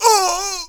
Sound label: human hit; deaths; starship trooper